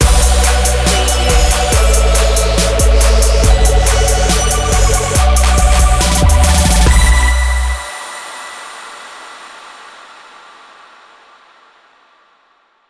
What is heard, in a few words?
stinger instr